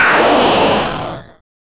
8 bit retro robot death

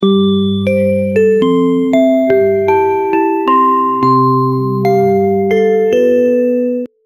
question appears message, trivia

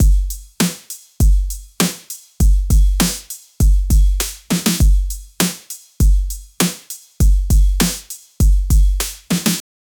uplifting beats with drum

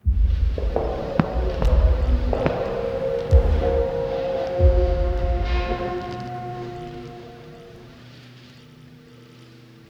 empty concert hall; 1970s

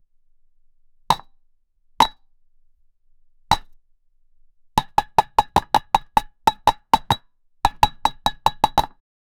a hollow rattle made by, mechanic

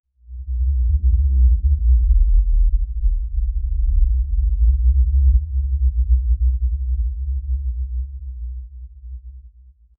reverberating booming bas

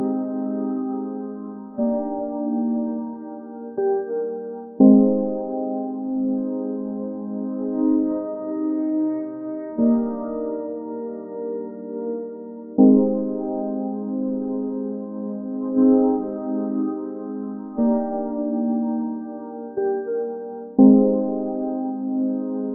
minecraft style stor

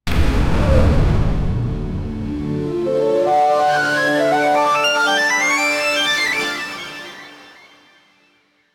futuristic winning sound, victory fanfare

